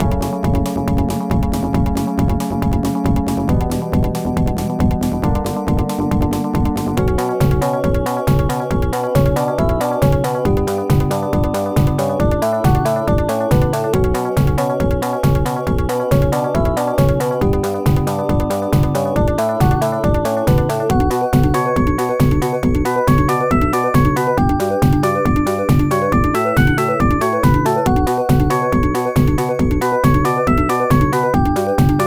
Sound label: Menu Music